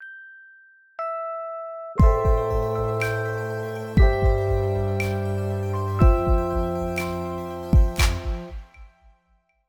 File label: jingle; Urkraft 2015